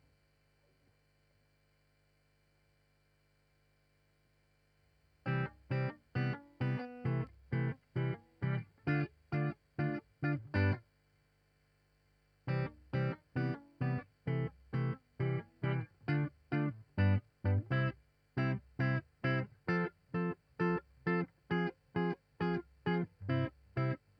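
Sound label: Guitar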